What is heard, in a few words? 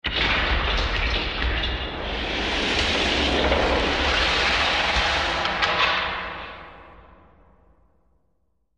Metal Eerie Distant, ambience